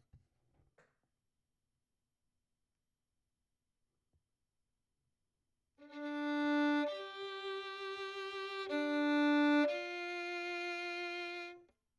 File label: Violin; Verdigris Music